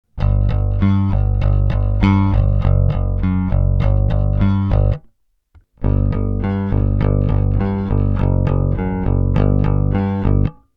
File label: Rickenbacker Pur